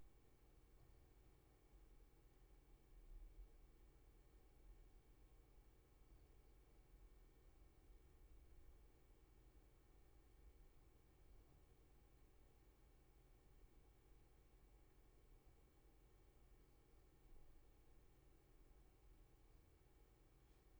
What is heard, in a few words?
roomtone